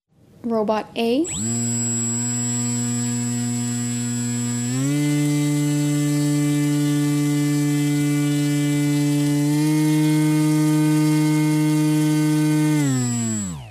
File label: flying robot noise; Noises of Flying Robots; robots distributed flight array